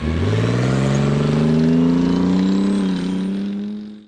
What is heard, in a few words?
jeep